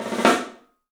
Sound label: MARCHIN ROL; 3D Sound; 3D Drums